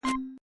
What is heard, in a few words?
new mail; Dargalon